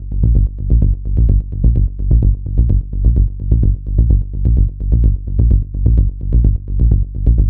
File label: Bass Loop Gmin 128, Melodic Techno; Role; Ghosthack; AC2024 - Day 12 - Melodic Techno